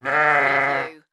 Sheep Baa; sound effects bible

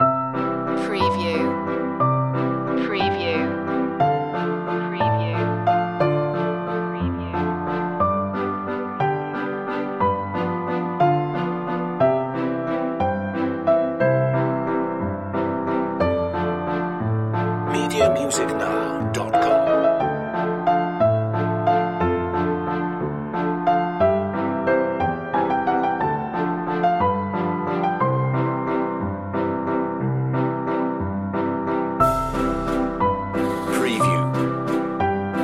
classical waltz

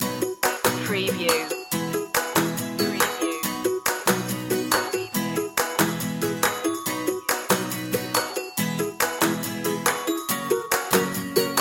keep it simple loop

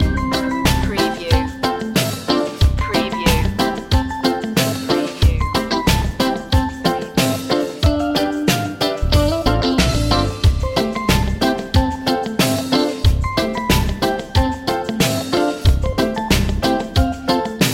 reggae simmons loop

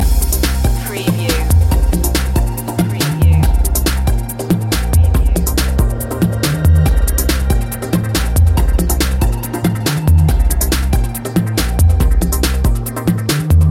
electric dreams loop

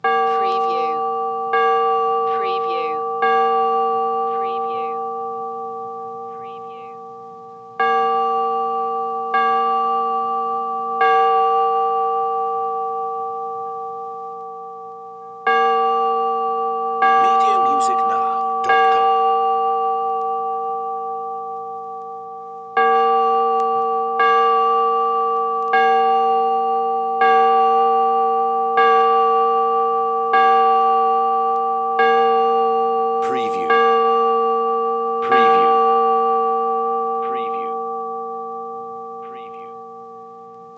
Church Bells Angelus

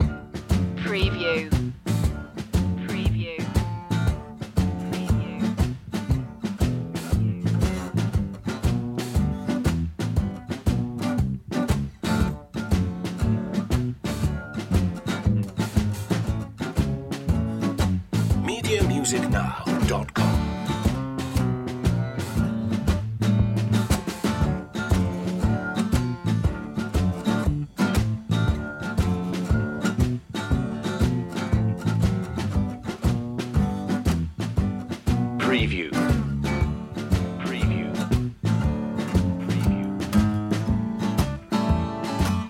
barbed wire blues loop

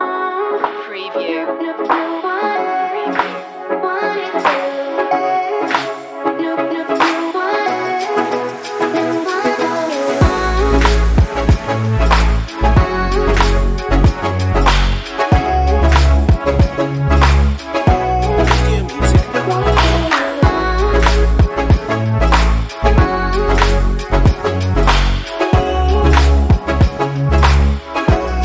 pop

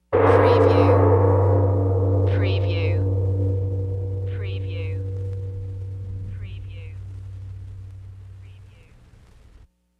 Gong Single

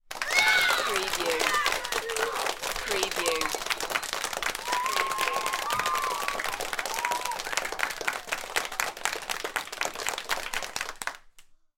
Crowd Applauding

